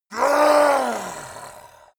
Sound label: yeti attack shout aggressive; schreie